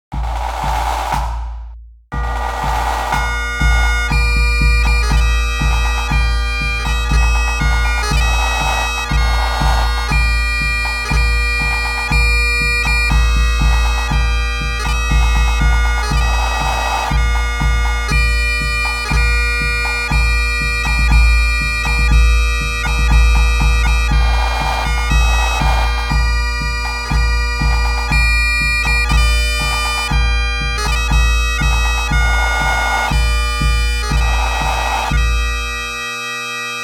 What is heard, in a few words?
Glory Be Pipe Band